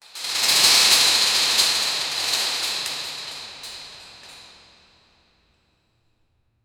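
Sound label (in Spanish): un gran telón de escenario, theater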